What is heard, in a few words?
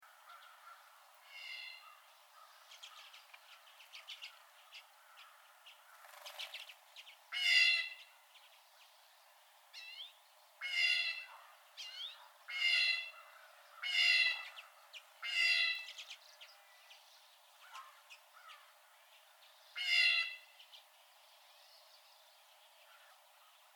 Blue Jay 16 raspy jay calls